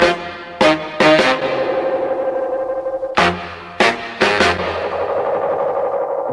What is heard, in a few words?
horns, phonky loops